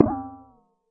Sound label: Processed Ethnic Percussion; Doru Malaia Percussion